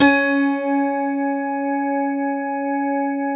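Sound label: magic piano C#3; DANCE PNO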